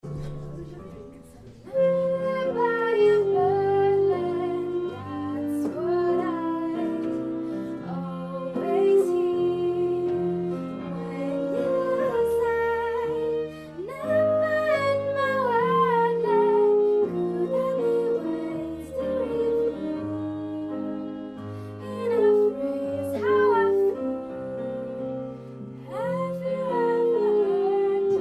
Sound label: acoustique